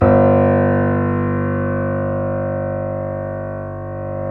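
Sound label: keys, YC7 piano pp; Roland L-CD701; pp YC7 St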